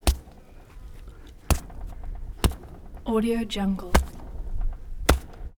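pig hits body impacts pounding back close up smacks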